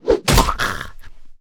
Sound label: zombie whit; DeadFright